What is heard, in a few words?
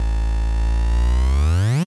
8bit se